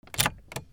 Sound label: CARS